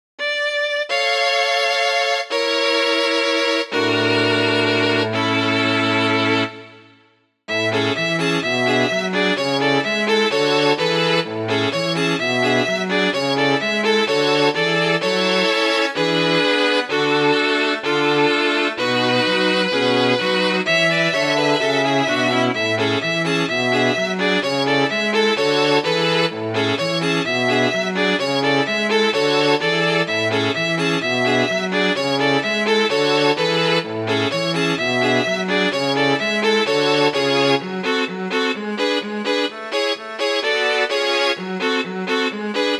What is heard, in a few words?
Quartet Composition